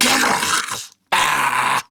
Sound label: Laugh Grineer Ghoul Sargeant; Grineer Ghoul Drill Sargeant; Battle Barks